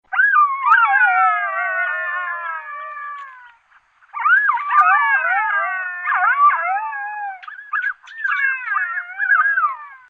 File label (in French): Cri de chacal